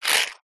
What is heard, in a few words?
money coin australian 50 cent slide short on tiled table; zapsplat